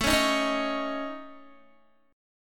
A Major Flat 5th A Guitar, chord; AMb5 strummed

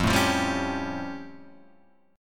A Augmented 7th F

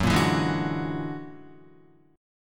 F sharp Augmented 9th F sharp Guitar; F#+9 strummed

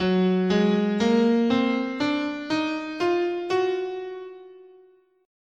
Gb Lydian Augmented, scale

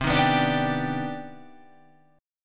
C Minor Major 9th, chord

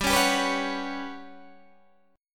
G Major 7th Flat 5th, Guitar, DADGAD

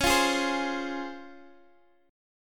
C sharp Major 7th, Guitar, Open G; x,x,11,10,9,10

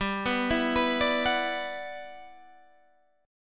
E Minor 9th G

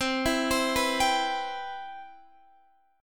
C Major 7th, Guitar DADGAD

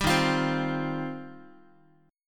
D sharp 6th Add 9th D sharp Guitar; strummed; JGuitar

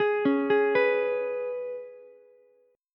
G sharp Minor Double Flat 5th G sharp, Ukulele; G#mbb5; arpeggiated; JGuitar

